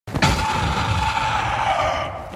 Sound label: sith dash; star wars